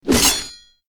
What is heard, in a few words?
BTN Bonus Click; slots; Realm Saga